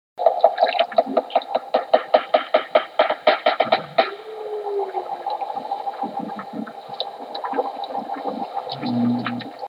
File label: walrus echolocation